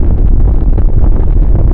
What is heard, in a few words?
Tracker Attack Fire